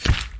splash hit; zombiestrd

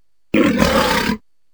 walrus attack; arctic life

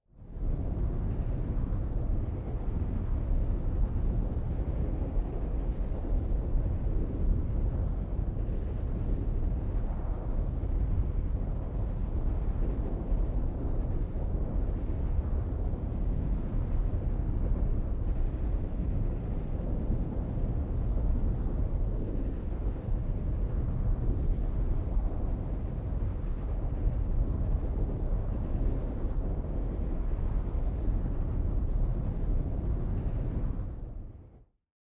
atmos overworld volcanic night; hades revisited; hades sounds

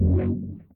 grav off; diplazer

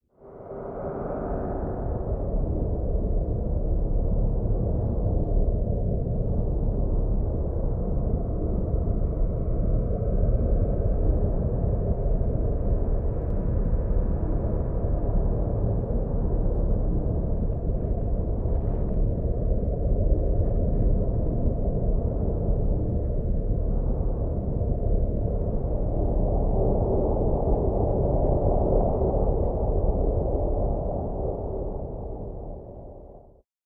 atmos underworld; hades revisited; hades sounds

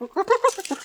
hyena

